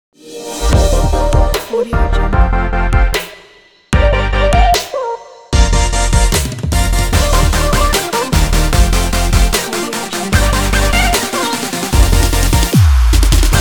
uplifting future bass logo

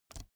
office keyboard laptop space release soft; foley; smartsoundfx

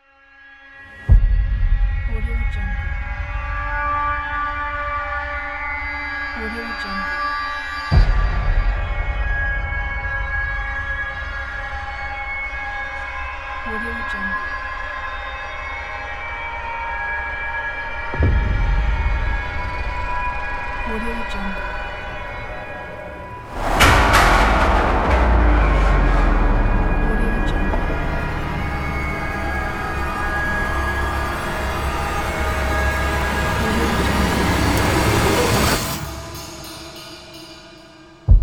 the creep horror

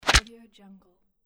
wooden arrow flesh impact

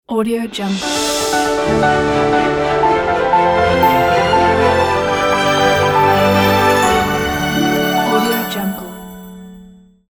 news block logo

